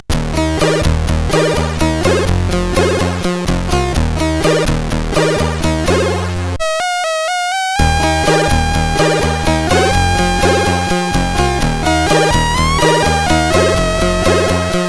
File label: Game Music, sid CSG8580R5